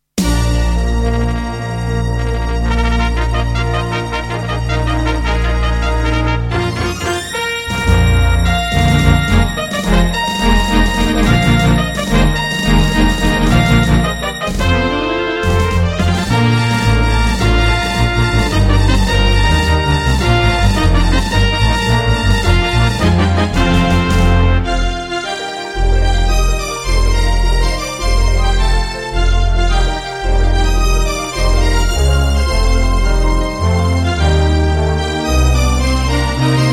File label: Intro, Midiplus MiniEngine USB; Dark Forces